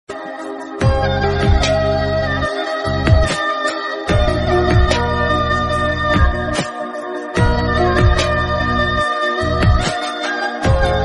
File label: turkish sad bgm theme